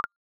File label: copy, audio feedback